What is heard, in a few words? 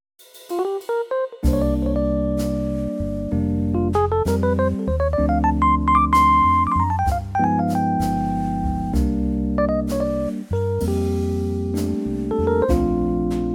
Rhodes trio Bb